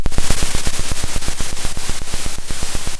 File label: pop, Sound of a drum roll